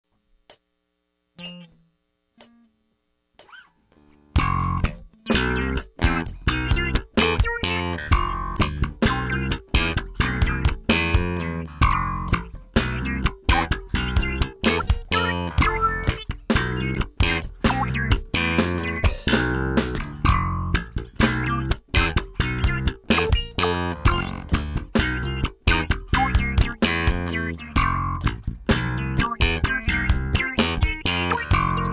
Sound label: Slap